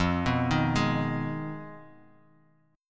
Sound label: F Minor 7th Flat 5th Guitar Open D; 3,2,1,2,x,x; down; chord shape